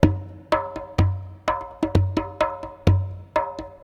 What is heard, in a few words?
PERC LOOP ETHNO 125; eJay